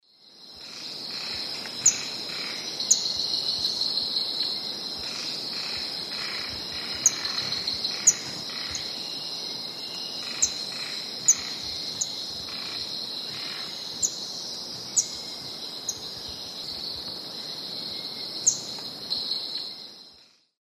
Heliothryx auritus, calls; S. America; Eastern Lowlands

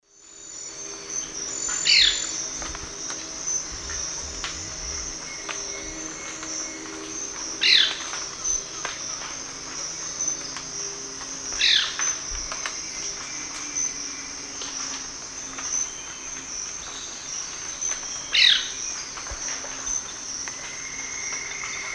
Manacus manacus, call; S. America; Eastern Lowlands

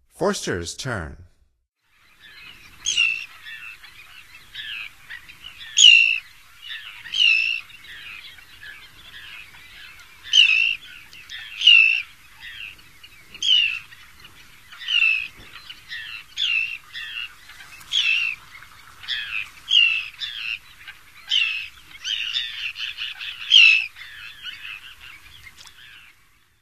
Forsters Tern; North America; Stokes

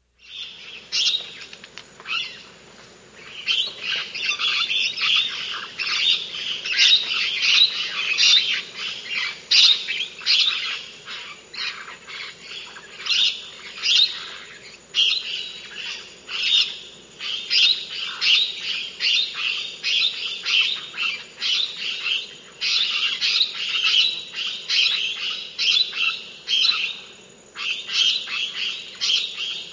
White-Crowned Parrot, S. America

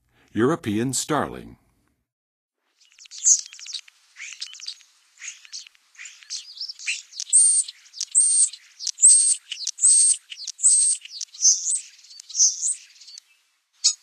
European Starling, Western USA Songbirds; North America

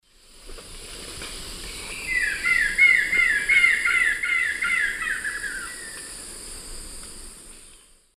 Monasa morphoeus, song; S. America; Eastern Lowlands

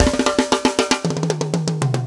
metal

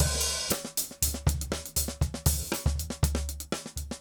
straight busy; odd loops

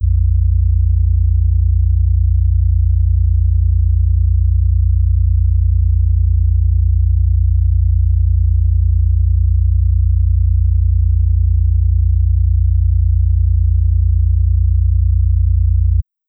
58 Hz and 87 Hz Tones; Legacy Audio Sampler II

